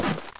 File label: grenade throw